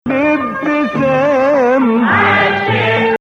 Nawa Bayati; Nawa Athar; Bayati 5

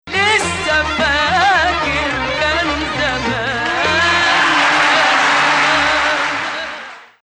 Ajam; Ajam, which resembles the Western Major scale

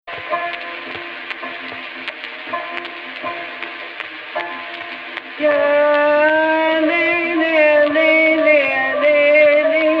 AHH, Egypt Rast

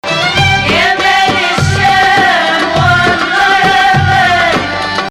Rast Ya Maal 2nd Rast

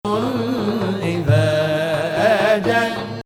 Ajam

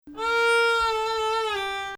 Bayati Nahawand, Maqam Bayati; could also parse within Bayati 1; Nah. 4

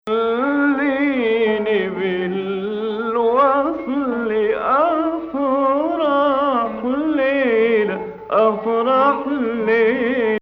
Hijaz Octave

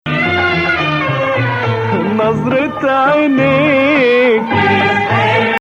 Nawa Bayati; same verse ending for all three verses

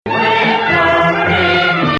Nahawand

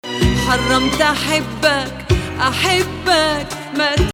Hijazkar Nakriz

